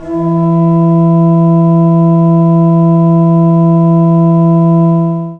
ORG G3, CHURCH ORGAN; Retro Sampler; AKAI S-612 + S-900

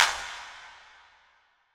Clap, Drum Percussion Single Hits

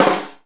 SNARE FLAM; Zero-G; Funky Elements

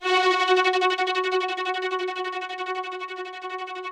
ROUGH STRNGS, Yamaha VP-1; Music Store

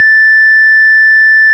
ORG, Farfisa; Roland LCDP09 Keys of the 60s and 70s 1; Farfisa Clar

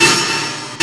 MACHINES

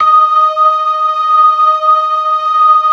LO MAN V L, ORGAN LOWER MANUAL

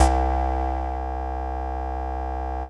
ESQ, SYNTH; AMG; Global Trance Mission VOL-2

